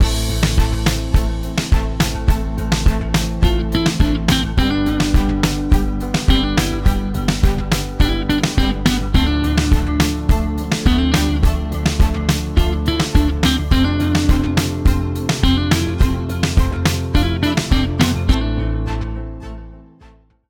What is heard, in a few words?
Tone